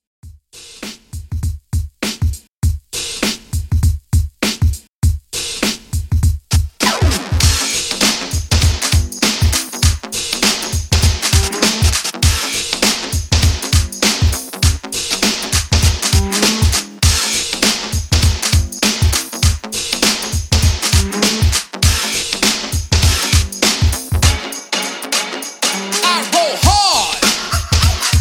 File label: Old School 100Bpm